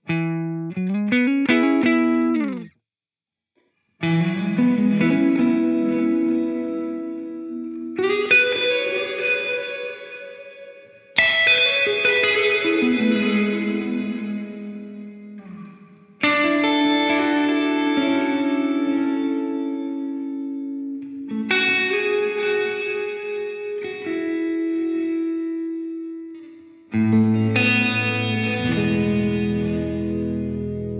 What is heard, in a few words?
Delay Reverb Plate